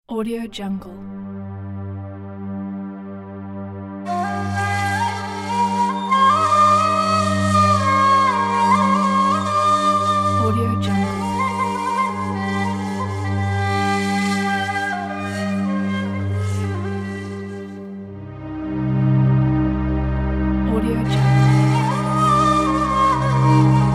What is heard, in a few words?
kaval song